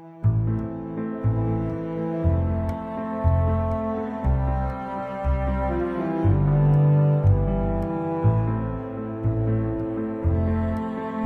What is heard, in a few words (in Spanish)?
Musica intro